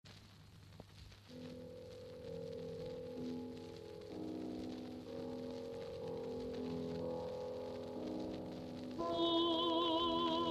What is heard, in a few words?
soprano fortepia; Vokalnyy cikl na slova Bloka